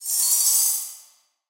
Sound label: refresh